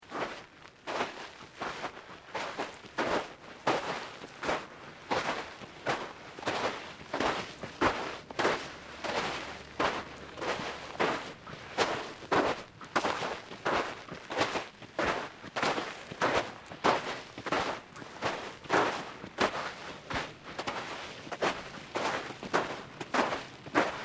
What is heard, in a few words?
Footsteps Boots Sand Sequence; Footstep Foley; Footsteps (Sound Effects:Human)